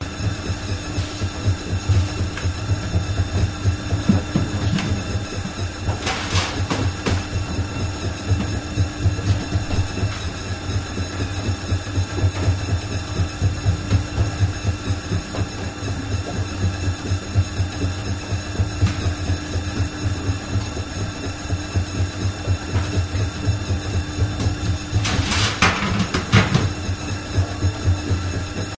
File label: Bakery Dough Mixer Running Several Noises In Background; German; your free sounds